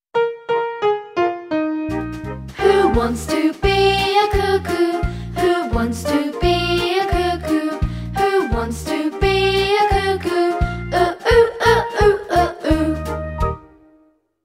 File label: Jolly Jingle, Sounds of OO oo